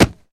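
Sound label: Hit Soft Single, cloth movement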